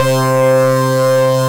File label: Funk O rama Solo, Synth Lead; Bass; Ableton; Creative Extensions